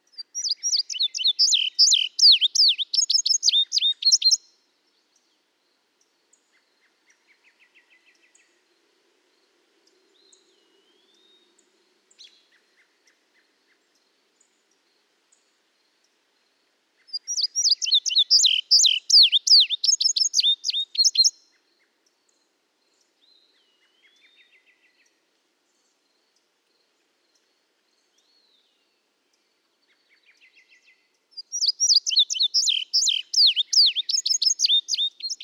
Indigo Bunting

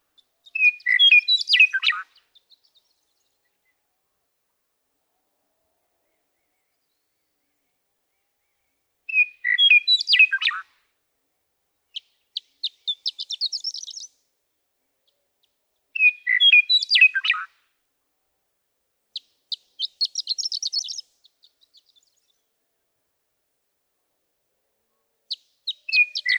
Western Meadowlark

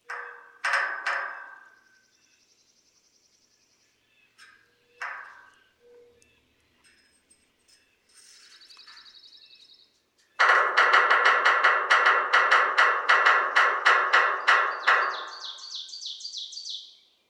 Yellow-bellied Sapsucker

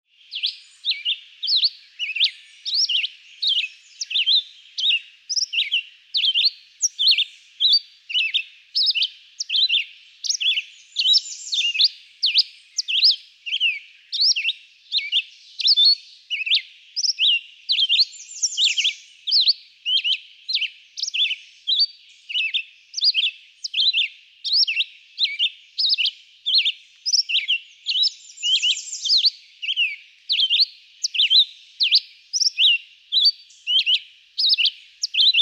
Red-eyed Vireo

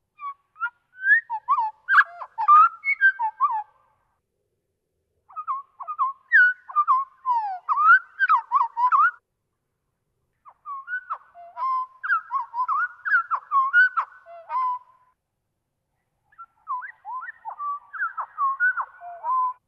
Scott's Oriole

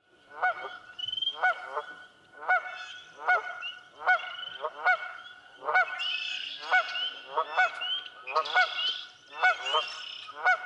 Canada Goose

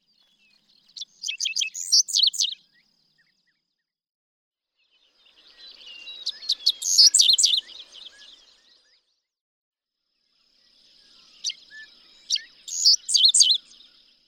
Dickcissel

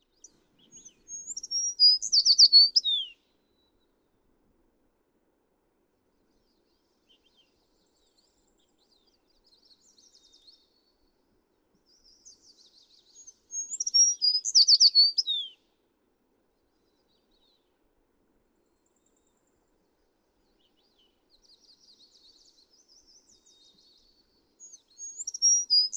American Tree Sparrow

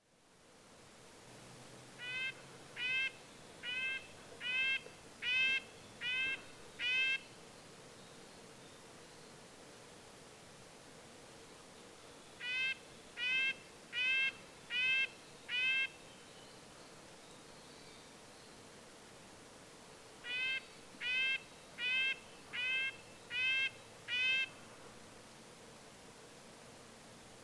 Red-breasted Nuthatch

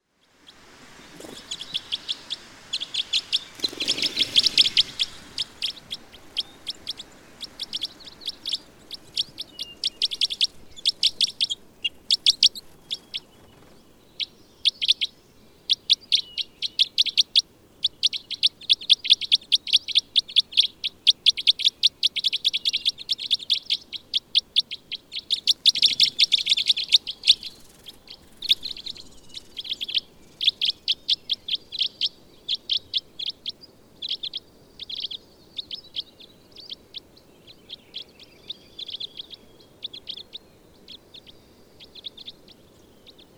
Red Crossbill

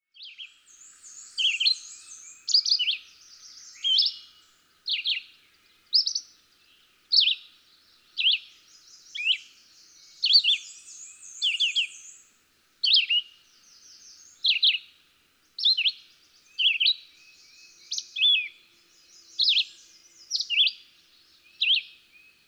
Red-eyed Vireo

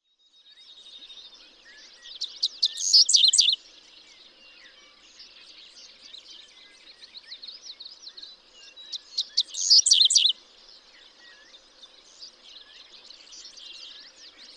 Dickcissel

